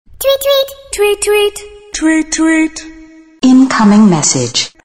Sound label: sms ringtone